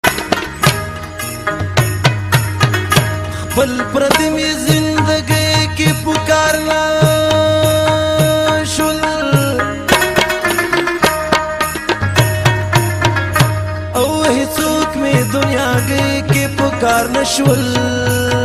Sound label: pashto tappy ringtone